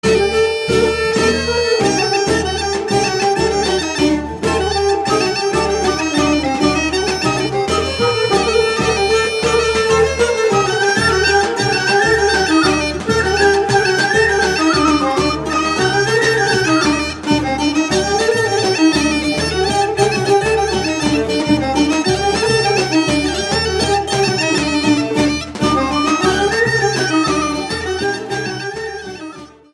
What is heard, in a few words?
Rachenitsa na horo